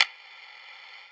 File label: percussion oneshot click; Pop; Drums; SD PASADENA Pop Sample Pack; Slate